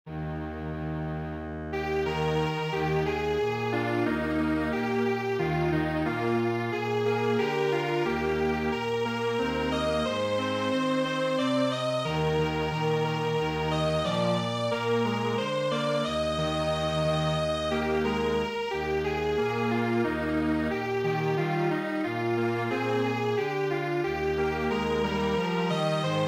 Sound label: Strings Quartet